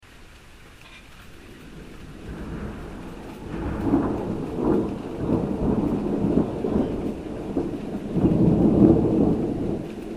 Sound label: rain and thunder including bird clock and a strange hooter at the end